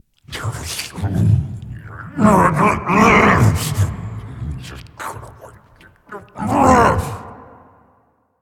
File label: grumbling; smite; kukulkan